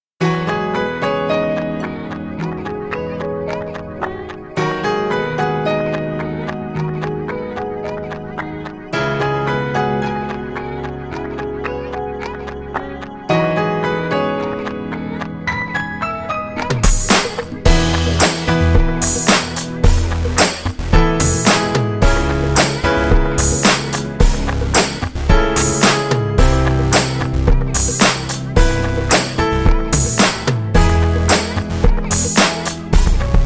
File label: RnB Piano Loops